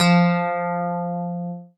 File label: FM key F; Polyend; ARC Noise